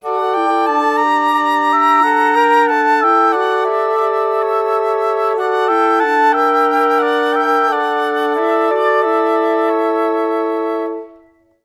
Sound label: 90 D maj, Woodwinds tempo; PowerFX; Downtown Orchestra